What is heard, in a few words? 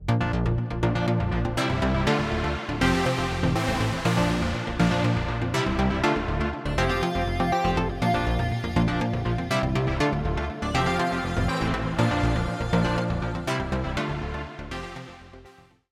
padsynth takeoff